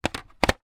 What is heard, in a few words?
felt tip pen place into plastic box set; office; zapsplat